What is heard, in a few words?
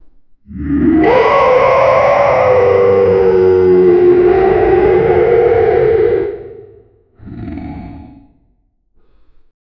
giant big monster shout